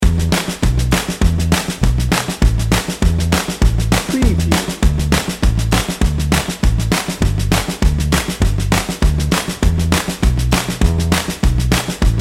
country folk two step

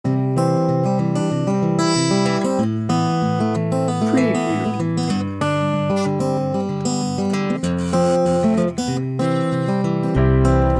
home grown bed no drums

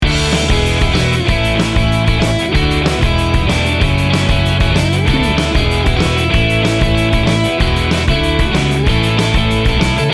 upbeat indie rock loop; Upbeat and energetic, featuring a driving Indie-Rock groove with bright electric guitars.

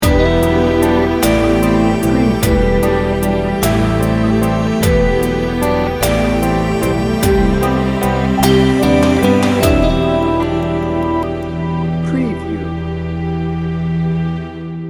breathing in sting bumper

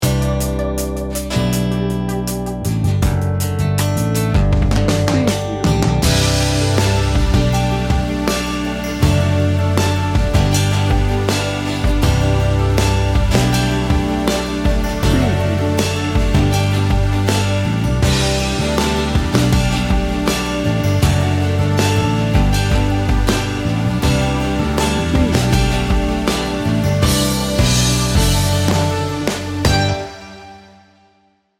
laid back and warm

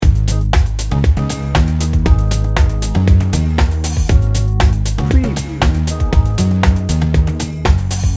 beat bounce loop

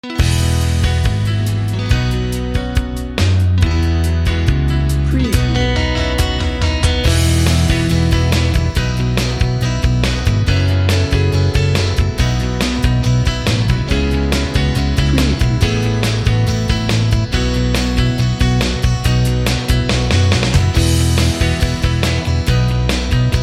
optimistic pop rock bed

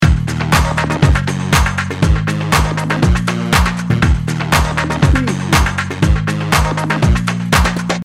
slinky beats loop

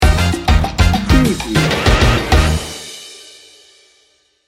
tropical party sting bumper

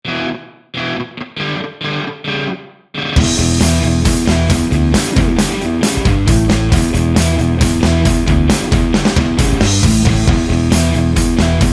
party power bed

